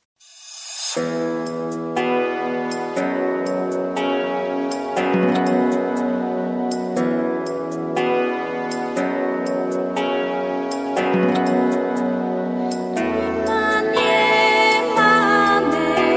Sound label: ambient with nature; uzdrawiajaca milosc